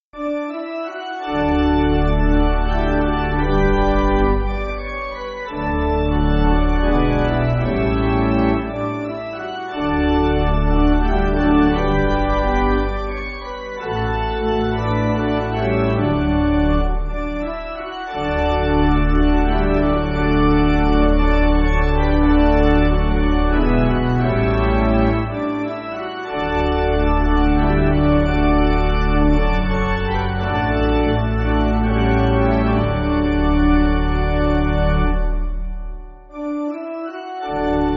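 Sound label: Pipe Organ, church backing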